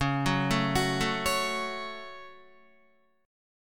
Db Suspended 4th Sharp 5th Guitar 4F,4A,5C,5G,5C,6E; arpeggiated